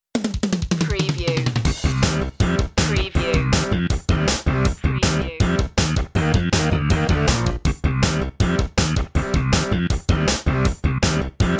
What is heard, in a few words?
retro video game racing rock